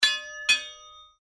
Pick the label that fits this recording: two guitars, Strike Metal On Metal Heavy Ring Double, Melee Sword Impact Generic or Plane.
Strike Metal On Metal Heavy Ring Double